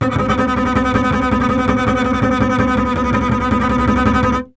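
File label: string contrabass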